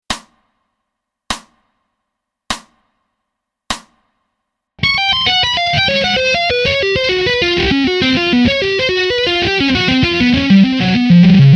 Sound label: Atonal Tapping Lick Slow